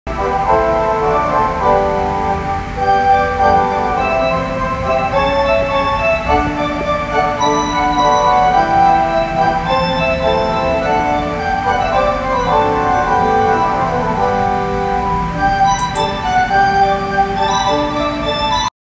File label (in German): Orgelspiel; schlieren